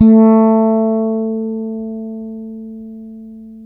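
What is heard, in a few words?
A 4, warm fretless, bass; Spectrasonics; Bass Legends CD 1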